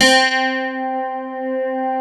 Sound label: pick piano; East West - Global Sounds; S.I KEYS